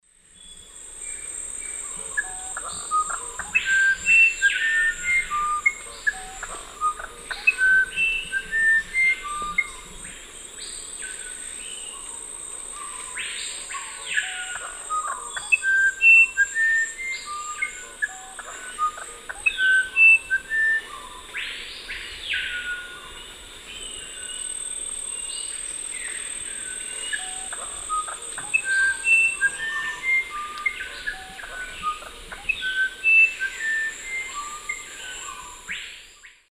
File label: Cyphorhinus arada, song; S. America; Eastern Lowlands